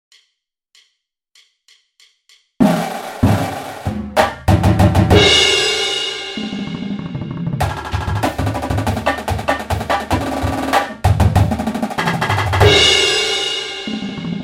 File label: PERC; HALFTIME NO. 5 - Back to the Future